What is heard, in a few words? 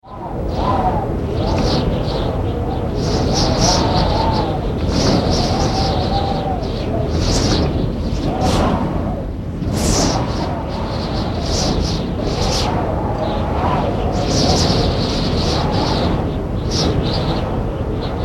Wind with Whistling Gusts; Wind (Sound Effects:Weather)